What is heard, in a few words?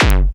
Yamaha CS6, Drums